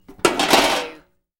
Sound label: Metal Debris Impact Settle